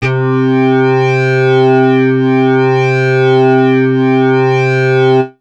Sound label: Synth; AMG